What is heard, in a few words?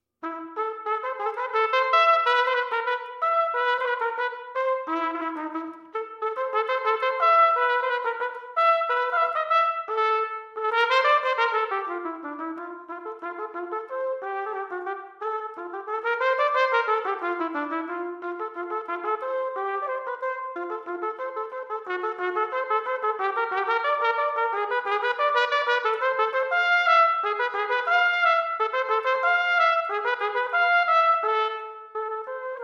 B Minor Etude Solo